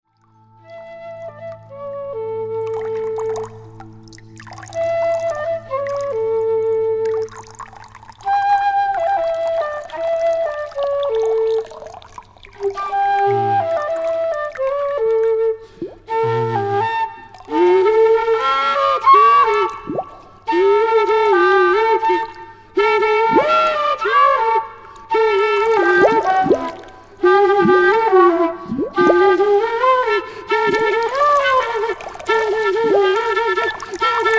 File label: studio percussion